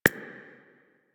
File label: UI Default Theme Type; Warframe